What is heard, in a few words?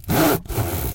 handsaw; RS Games Sounds; Monopoly